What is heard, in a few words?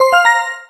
Pop Up; Entaz Baseball 2011; Android